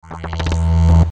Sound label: Electrical Short Surge Hum Buzz; Production Element Imaging Element Accent Transition